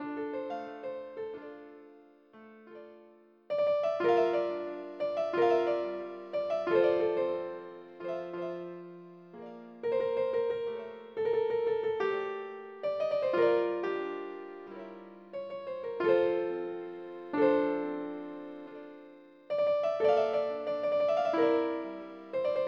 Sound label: ACCORDEI